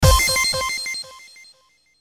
Hit Drums Digital Beeps Delay; Hit Drums Digital Beeps Delay Ascend; Production Element Imaging Element Accent Transition; Blastwave

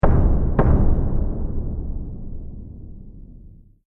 Drum Hit Trailer Forbidding Double Impact Accent; Musical; Castle Door Knock Haunted Eerie; Sound Design; Trailers (Sound Effects:Sound Design)

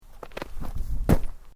Footsteps Boots Gravel Fine Jump

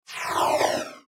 Sci Fi Spaceship; Spaceship Door Open; Spacecraft

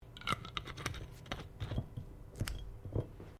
Bottle Glass Cap Metal Screw On

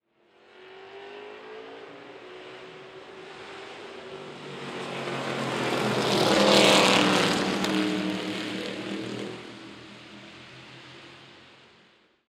Race Car Drives By, Long In, 1960S Era Road Racer; Cars; Vehicles

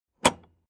Industrial Large Electric Switch Off Metallic

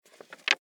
Office Envelope Manila Open Lid; Paper Sound Effects; Paper (Sound Effects:Offices); SFX Bible 6

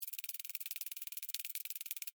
Insect Wings Fly, Steady, Bug; Animal; Wing Sound Effects; Animals

